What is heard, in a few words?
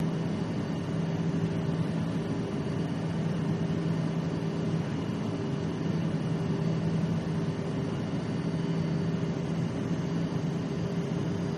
Lear Jet Int Loop, interior